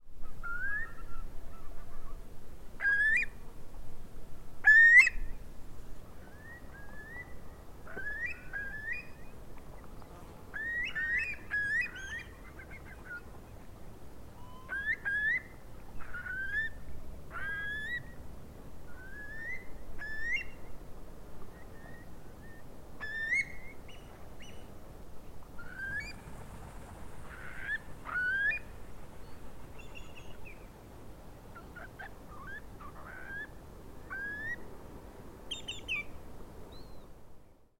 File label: Eurasian Curlew Numenius arquata